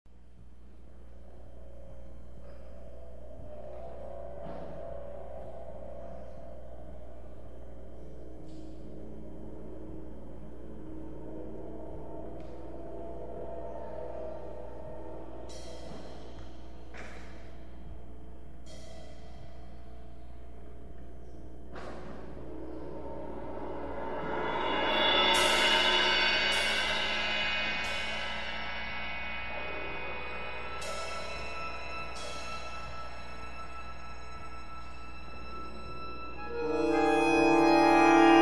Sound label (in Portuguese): para Harmônica de Boca e orquestra de Câmera; Diálogos do vento para Harmônica de Boca e orquestra de Câmera (2000); Harry Crowl